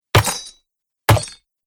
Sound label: Battle War 2 Club Hits; Battle Sound Effects